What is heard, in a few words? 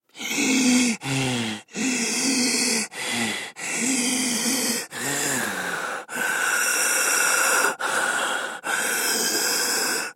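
wheezing in a human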